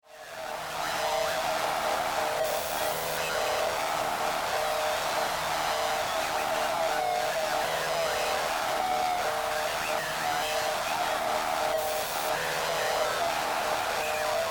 Science Fiction Spaceship Cockpit After Crash Damaged Communications; Spacecraft